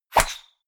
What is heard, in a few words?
golf ball hit